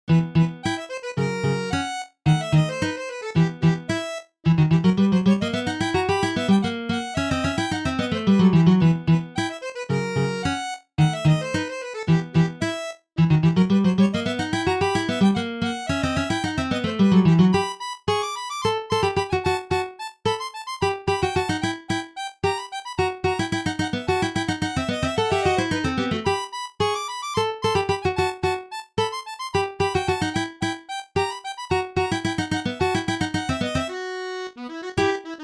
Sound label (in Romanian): hora lautaresca